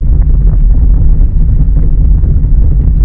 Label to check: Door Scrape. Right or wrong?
Right